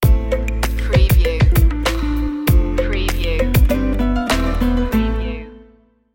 electro latino sting

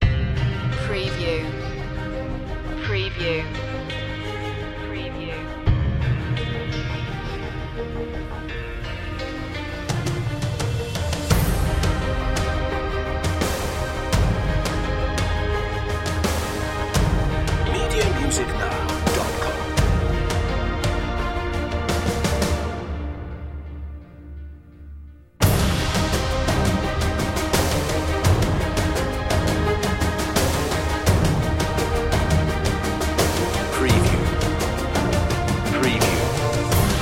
in a near future no choir

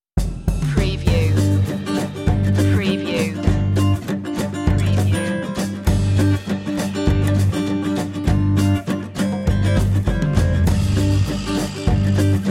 river house

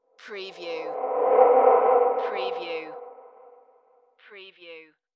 Ghost Appearance; sound-effects-bible

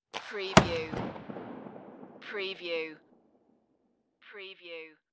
Hockey Slap Shot Puck; Sound Effects Bible

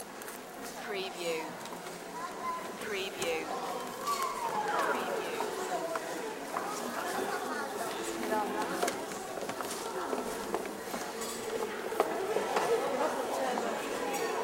indoor market ambience

